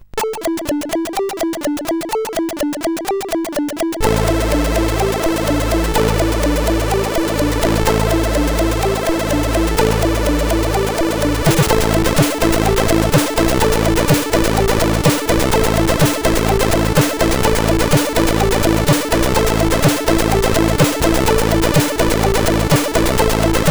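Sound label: Meditation, MOS 8580R5 SID chip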